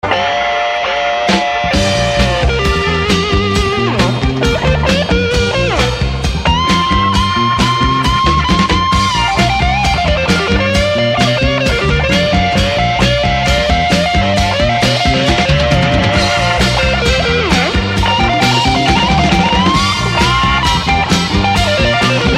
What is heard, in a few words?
Solo